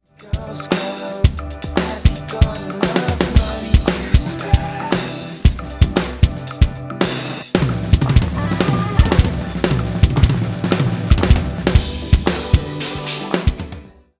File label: Fill